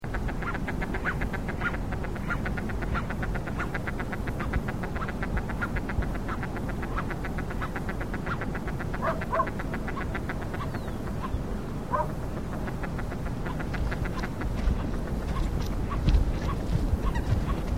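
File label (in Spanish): pato creston, Lophonetta specularioides, Crested Duck; cantos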